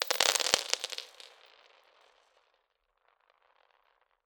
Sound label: Spill On Floor, Medium Wine Glass